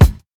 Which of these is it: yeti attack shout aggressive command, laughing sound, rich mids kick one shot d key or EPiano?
rich mids kick one shot d key